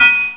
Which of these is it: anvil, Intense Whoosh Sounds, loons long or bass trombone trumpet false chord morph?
anvil